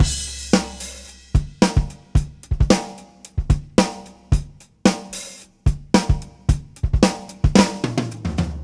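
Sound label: Beat Loops, 111 bpm; Zero-G - Monster Beats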